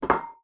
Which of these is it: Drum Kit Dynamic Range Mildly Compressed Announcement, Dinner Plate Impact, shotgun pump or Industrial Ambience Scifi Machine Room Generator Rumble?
Dinner Plate Impact